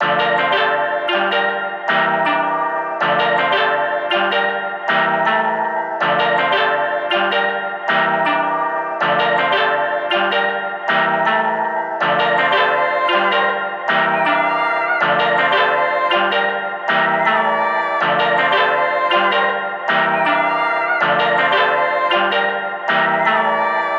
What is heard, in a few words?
Melody Loop - 160 BPM D Min, Hip Hop; Cymatics - 9God Hip Hop Sample Pack